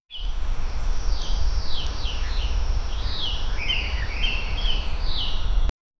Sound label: morning ambience distant, birds